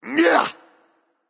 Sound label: grunt attack claw; smite; voicepack; set